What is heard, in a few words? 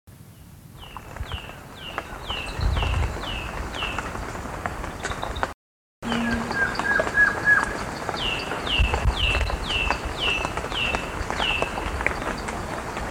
Icterid